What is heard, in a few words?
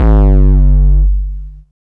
HD BD, Hard & Loud Techno